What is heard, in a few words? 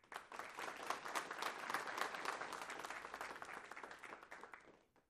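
Crowd 50 Light Burst Interior; small int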